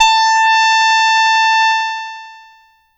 Lead Synth

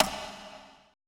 CONGA SLP1 R; BPC DRUMS

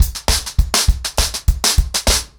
100BPM, ACOUSTIC DRUMS; The Roots Drumloops

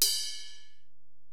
Ride, Alesis DM-PRO, Drum Machines

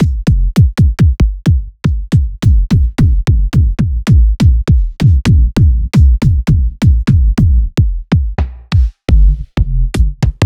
Kicks Synth Short; Ghosthack

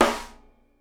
SNARE, Live Snares

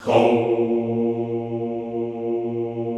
HOUUU, Classical Choir

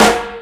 Mega Drums, Drum Machines

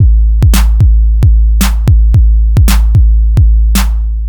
NRG Break, Trance, Drums, Breaks

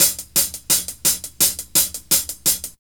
REAL 86, DRUM LOOP; Ueberschall; Jam Box

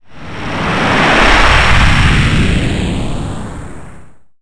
sfx sci fi; Masterbits - Switch FX

